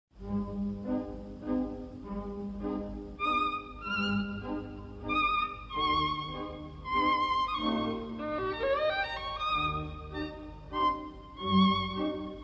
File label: Female Variation FAST; Satanella